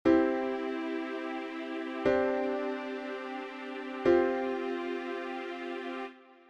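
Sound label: C Major sus chords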